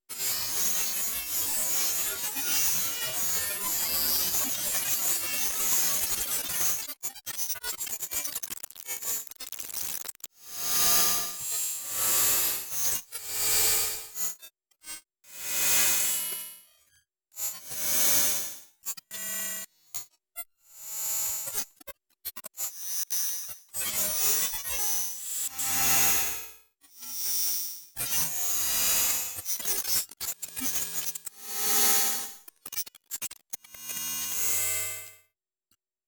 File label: ambience